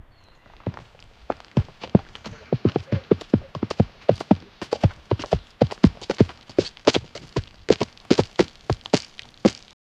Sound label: four beat deer hoofsteps